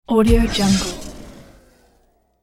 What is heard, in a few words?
magical disappearance